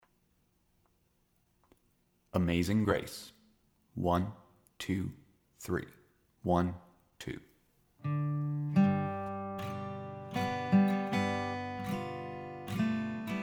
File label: Melody 75 BPM; Amazing Grace; Mel Bay